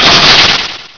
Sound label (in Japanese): biri